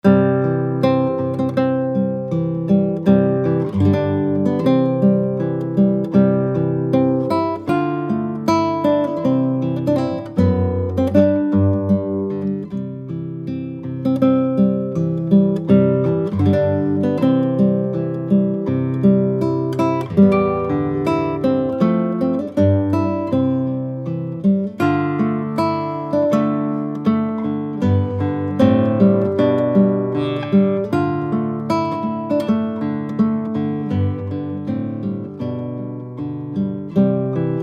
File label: Wedding March